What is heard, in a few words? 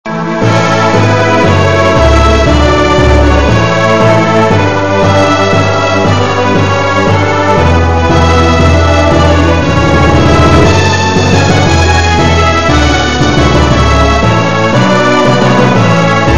main song